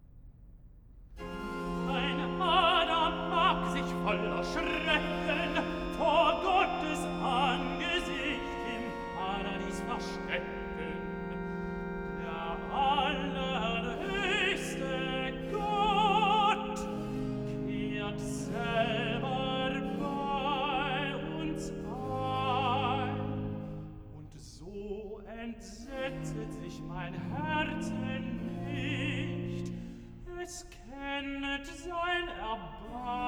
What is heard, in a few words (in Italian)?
Recitativo (Tenor); Cantata, BWV 133 'Ich freue mich in dir'; Bach; New York; 3rd Day of Xmas